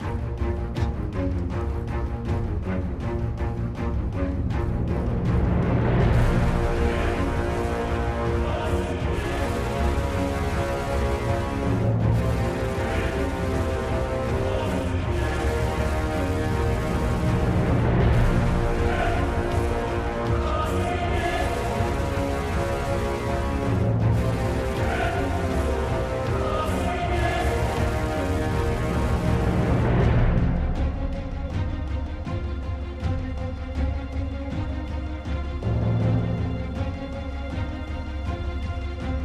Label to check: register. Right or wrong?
Wrong